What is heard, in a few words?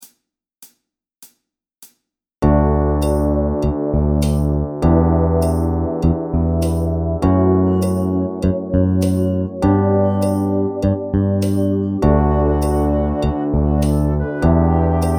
Drum Grv Med